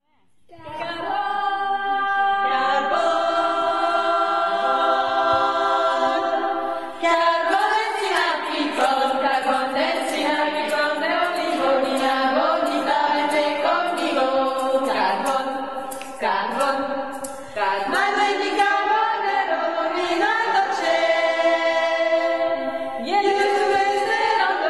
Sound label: chorale